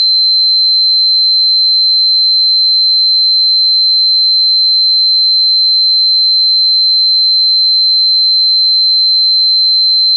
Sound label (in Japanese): 4096 Hz, 信号音; 9 オクターブ上